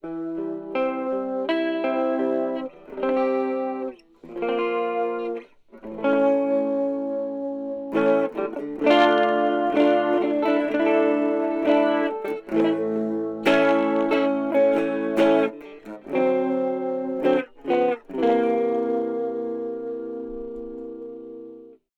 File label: clean chorus; fluid audio strum buddy test